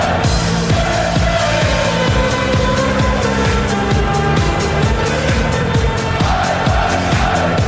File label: Darts 180 Music